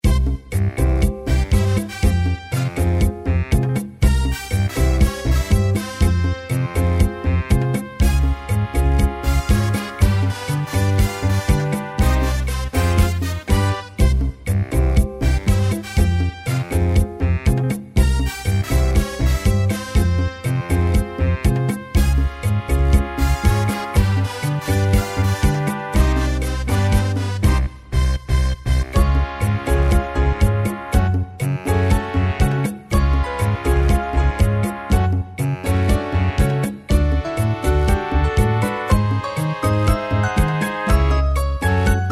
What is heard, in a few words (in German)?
musik